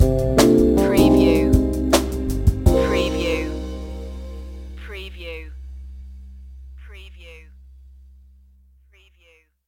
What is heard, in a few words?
jazzy chill stinger